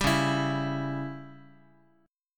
Db Diminished Db down Guitar; strummed; JGuitar

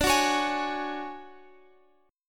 D Major 7th Flat 5th D down Guitar Open F; DM7b5 strummed

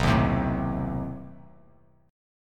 B 7th Flat 9th B down, Bass Standard; B7b9 strummed; JGuitar

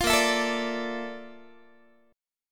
A sharp Major Sharp 11th, Guitar; strummed

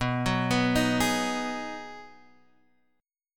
B 6th B down Guitar Half Step Down

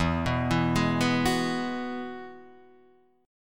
E Major Add 9th E down Guitar Open D

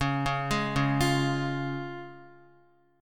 C sharp Major, Guitar; x,4,1,1,1,1; arpeggiated; JGuitar

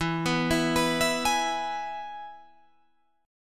E-Major E, Guitar Drop D

